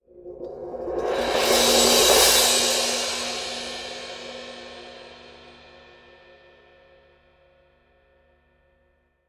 Cym crescendo-decres, Cymbals, Orchestra; 90 s Sample CDs; PowerFX - Downtown Orchestra

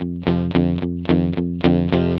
ROCK F 110, Vintage Blues Guitar; USB Soundscan vol.22; AKAI; ROCK2

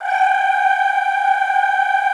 G4, Extended Classical Choir; Best Service

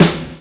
SD, snare, 70 s Breakbeats

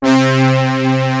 SYN HORN; East West; Global Sounds; MKS

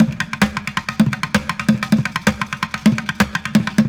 Street Groove, Ambient Grooves

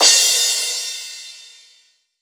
Main Room Cymbal; essential drumkit

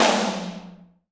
TOM, Dance

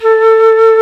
Flutes, Flt amb

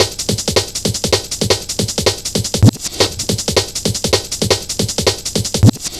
160 solo, Drum Loops, Drum & Bass; Total Drum&Bass

